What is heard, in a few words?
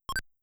Attack